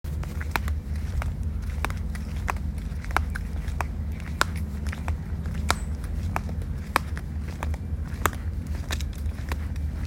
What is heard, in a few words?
Flip flops